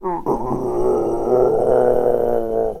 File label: Maniacal Witches Laugh Sounds; scary